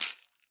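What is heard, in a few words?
rattlebone, percussion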